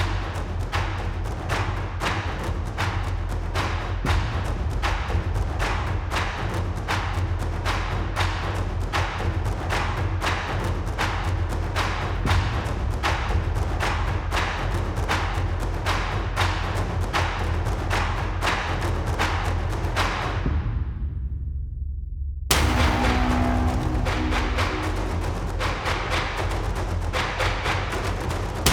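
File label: Heavy Blades Large Perc; Grineer; Grineer One B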